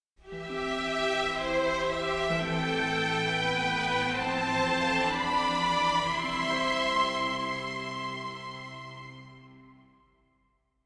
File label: MUSIC STING ROMANTIC; HUB